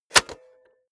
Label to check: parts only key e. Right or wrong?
Wrong